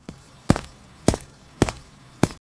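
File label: a single footstep sound; environment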